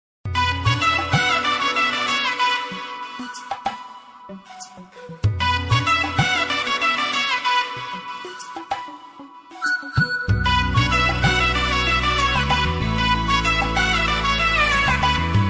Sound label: tamil love song bgm ringtone; kerala song